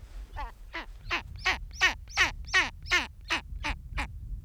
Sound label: skua sound